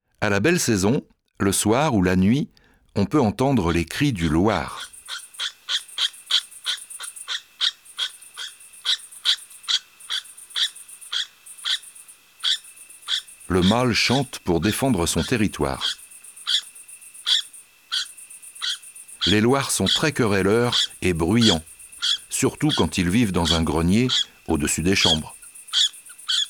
Loir gris; ABC de la nature

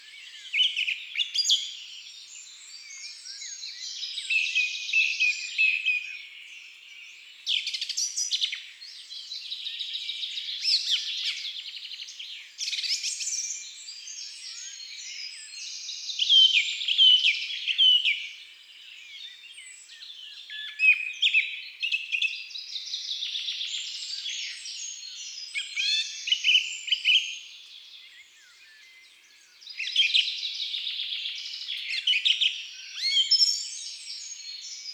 Grive musicienne Chants d oiseaux des bois et des forets

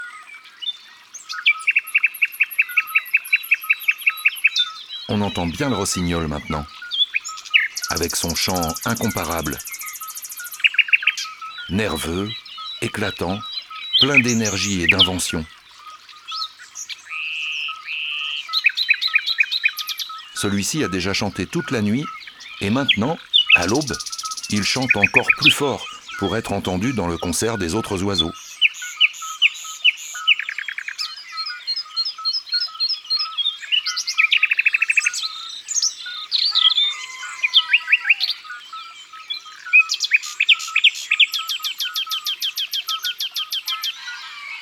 Rossignol philomele; abc de la nature

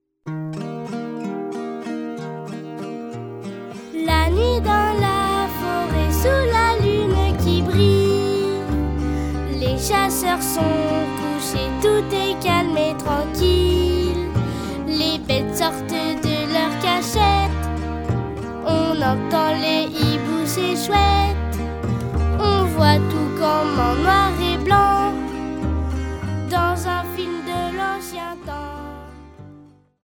Chanson La foret sous la lune

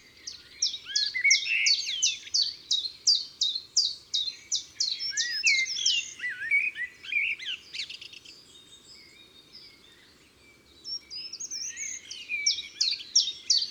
Pouillot veloce, Chants d oiseaux des bois et des forets

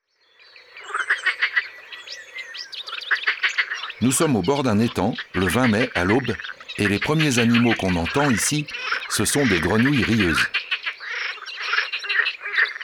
Grenouille verte; abc de la nature

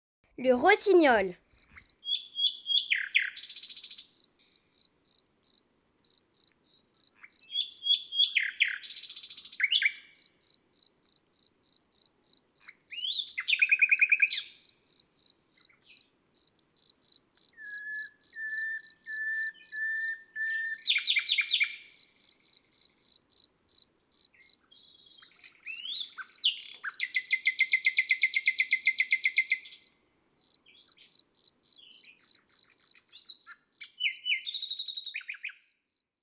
Le rossignol; mon imagier sonore de la nature

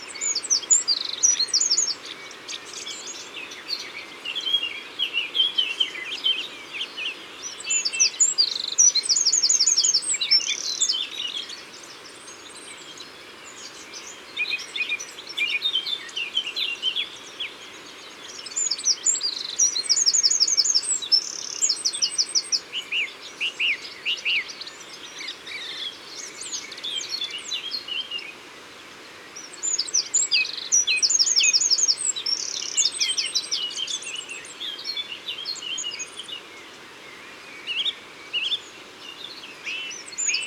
Troglodyte mignon; Concerts naturels de l Isere; Studio Les Trois Becs

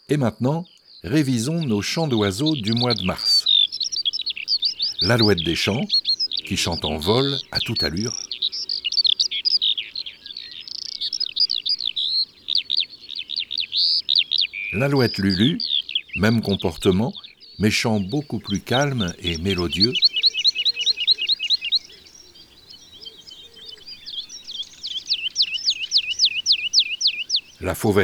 Mars, chants d'oiseaux

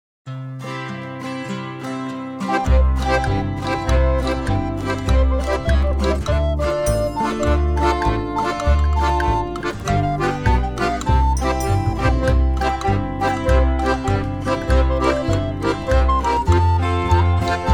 L acrobate version instrumentale

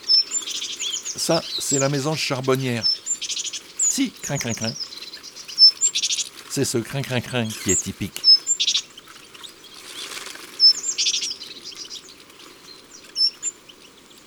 Mangeoire Mesange charbonniere

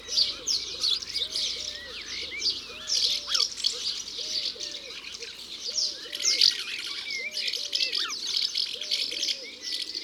Hirondelle de fenetre ABC des chants d'oiseaux

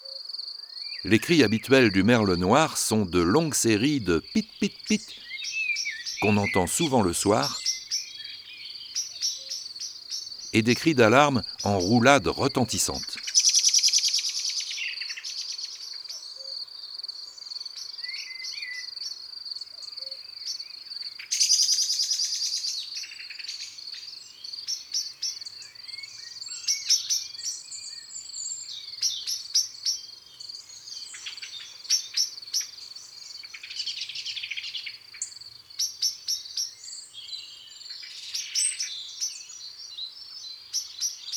Merle noir; oiseaux des jardins

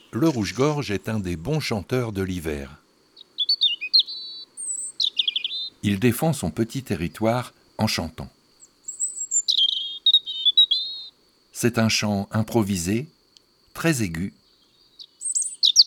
Le Rougegorge familier; chants d'oiseaux

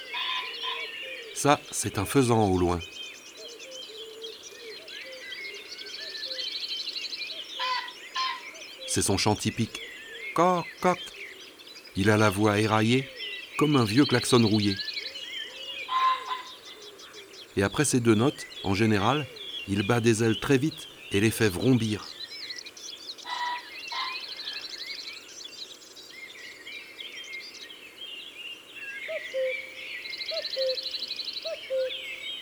Faisan de Colchide; ABC des chants d oiseaux

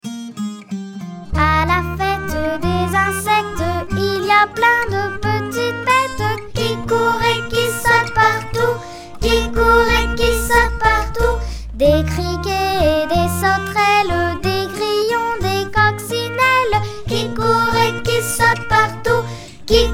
Chanson la fête des insectes

